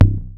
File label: Bass Drum, Cwejman S1 MK2, Drum Machines